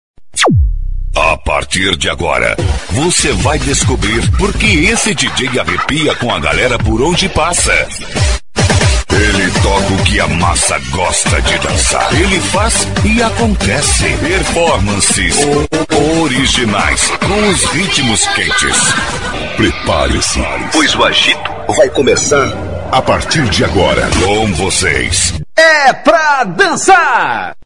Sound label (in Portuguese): Abertura DJ; Vinhetas